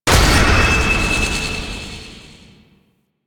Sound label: Drone Destroy; Warframe; Veilbreaker; Venkra